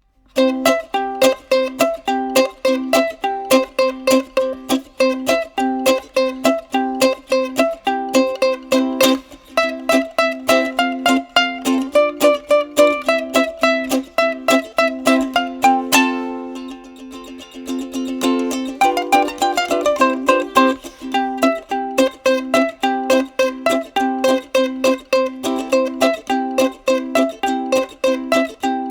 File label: Instrumental, Ukulele; Ukulele Ile Cocuk Sarkilari